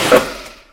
carwash end

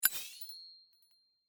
butt tap; casino

